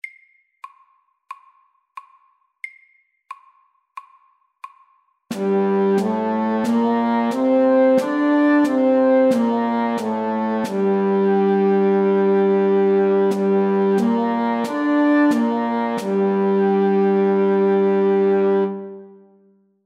G moll

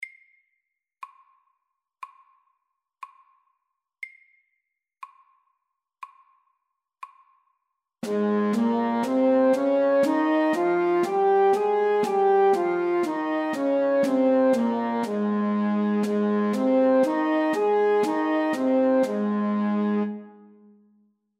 Ass dur natura SAKTE

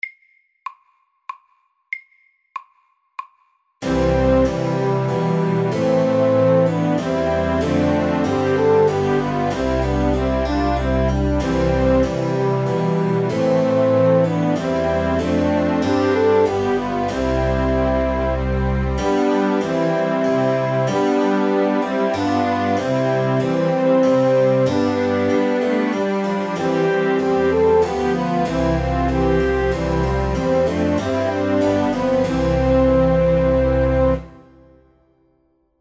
Menuett SAKTE

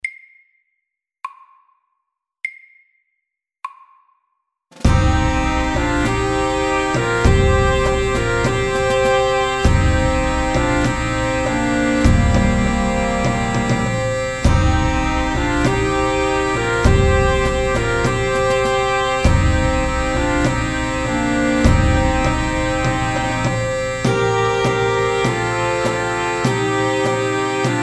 Pipes and drums MIDDELS

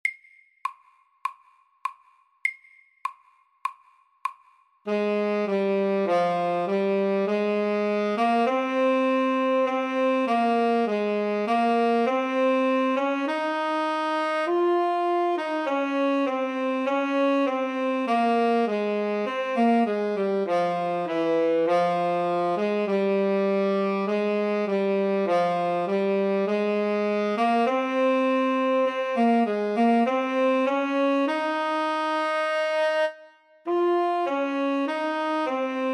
Etyde MIDDELS

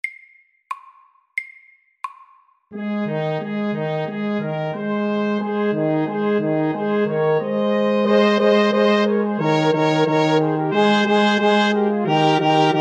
Fanfare FORT

